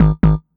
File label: incorrect answer